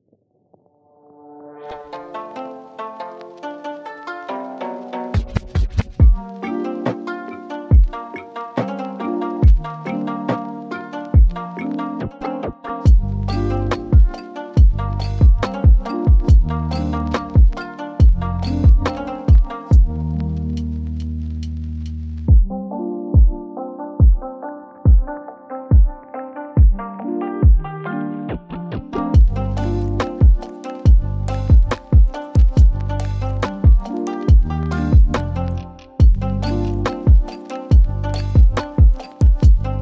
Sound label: lofi hiphop; Jujutsu Kaisen